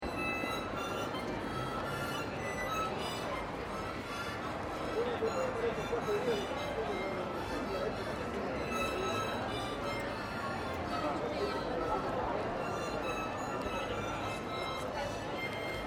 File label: City Crowd Crazy Harmonica, Crowded street with many voices in Swedish. Street musician plays harmonica